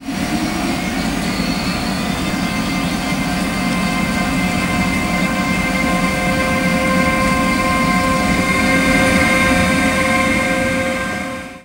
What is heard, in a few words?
ac loco generic; panos g; simutrans; Pak128.Britain-Ex